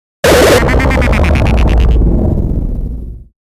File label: Synth Warp Stutter Glitch; 5.1 STEREO MIX; Warped; Blastwave